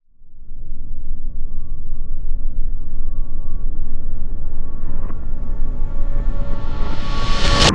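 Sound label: Reverse Cracker Smasher, Cinematic Metal Impacts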